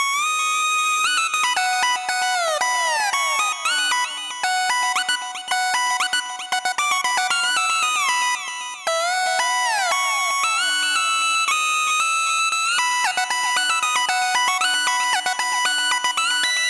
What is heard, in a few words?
lead; rhythm-quest